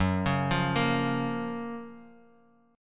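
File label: G flat Major 7th G flat; GbM7 arpeggiated; JGuitar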